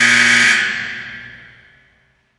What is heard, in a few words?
Basketball; Sfx Basketball